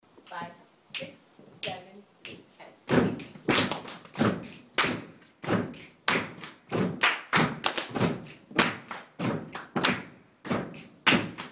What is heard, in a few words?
Body Percussion Class